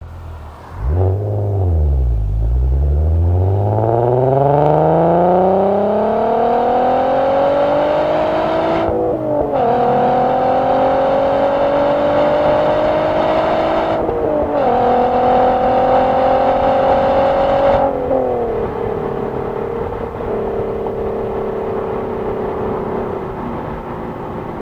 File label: r32 second gear launch; going through several gears